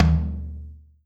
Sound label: TOM